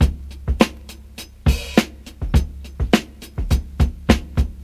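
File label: hi hat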